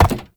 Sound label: Step Glass, Movement; Uriel; Warframe